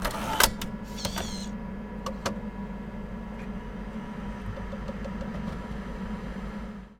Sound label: disk load; 1000fx; OFFICE